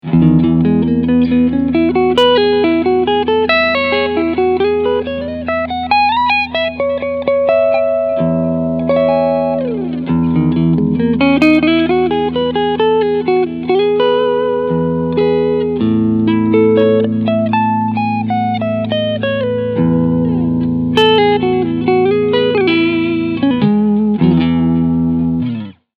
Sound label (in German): Cleansound Front